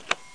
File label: MEDAL; RE-Sounds